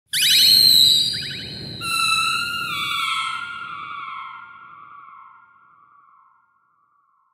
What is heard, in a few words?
tunnel